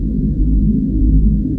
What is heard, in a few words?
BIGDRONE; lander